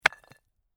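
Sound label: Brick Impact Or Cinder Drop; Stone slab, brick or cynder drop to the ground and settle.; Rock Sound Effects; Lynne Music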